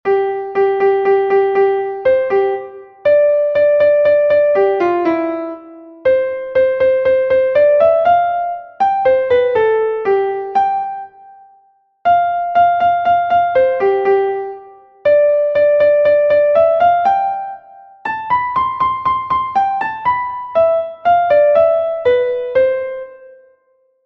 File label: classical piano